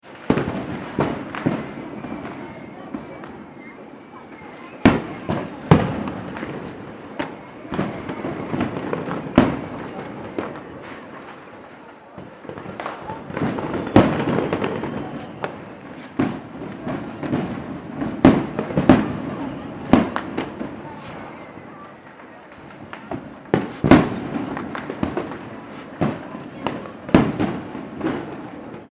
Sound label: fireworks mixture urban, Fireworks (Large And Small) In City/Town; Mixture Of Large And Small Fireworks Close By And In Distance; Fourth of July; McKinneySound